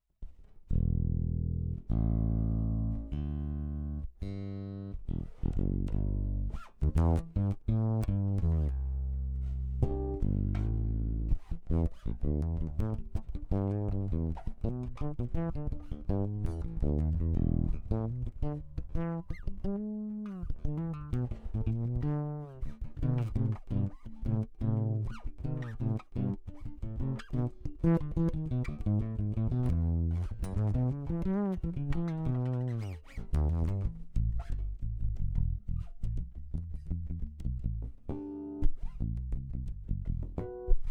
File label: Piezo, bass